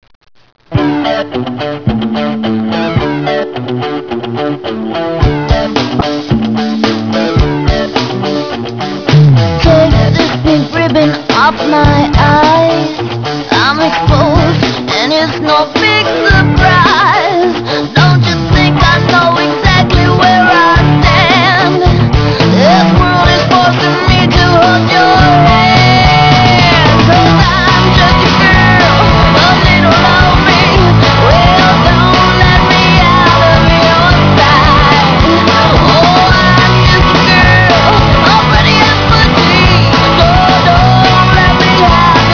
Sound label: girl